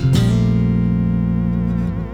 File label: bass Trill